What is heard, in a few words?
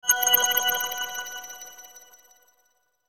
SHIMMER RING; SHIMMERS; Blastwave